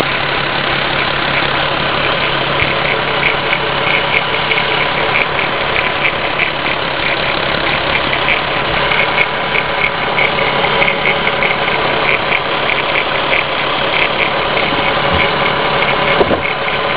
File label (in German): motor, Audi A3 VR6 3.2 Liter Motor; Steuerkette; Schleichende Längung der Steuerkette beim Audi A3 VR6 3.2 Liter Motor; Audi A3 3.2 quattro